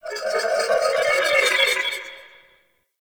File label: Ship Market Data Blips; Warframe; Corpus Ship Remaster